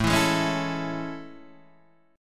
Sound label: A Major 7th A; AM7; strummed; JGuitar